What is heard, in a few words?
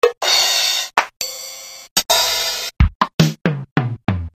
Casio RZ1